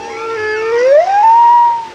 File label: Strange Sing; Zero-G Jungle Warfare 1, 2 & 3; 90 s Sample CDs